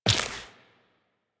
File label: Step Flesh, Footsteps, Walk; DE; Warframe; Tenno